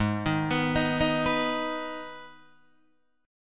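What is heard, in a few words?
Ab Minor chord, Guitar, Open D tuning; 6,6,9,9,6,9; down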